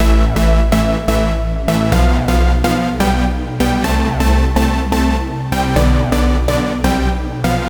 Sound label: House sample 125 bpm